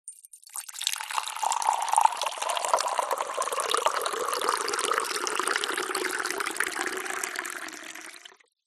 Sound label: pour water into mug from kettle; food drink